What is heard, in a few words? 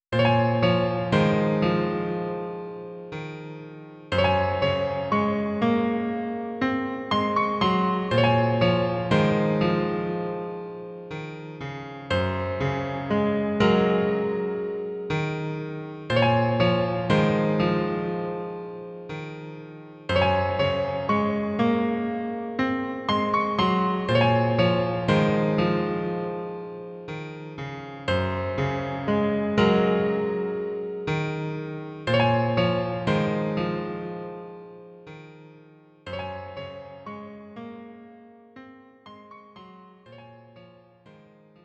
Requiem; Synthetic Origins Chrono Trigger Radical Dreamers 2022; Dream Shore